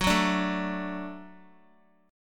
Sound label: F sharp Minor Sharp 5th F sharp Guitar; F#m#5 strummed